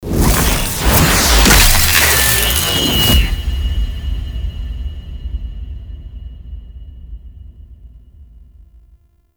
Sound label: Player Mask Burst; Warframe; The New War; Deacons